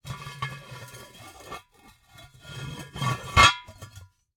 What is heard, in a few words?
Cookie Jar, ceramic, glazed, irregular shape, hollow base, hollow top, rough, resonant, tonal, jostle; Eiravaein Works - Vaeyan IV; strong